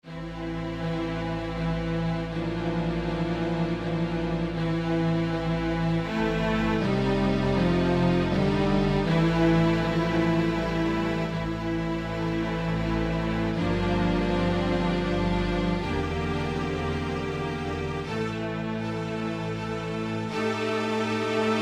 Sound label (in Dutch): orkest, agnus dei; Missen; Peeters, Flor